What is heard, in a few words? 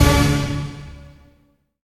HIT ORCH.; Roland LCDP08 Symphony Orchestra